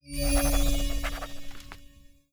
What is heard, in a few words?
journal note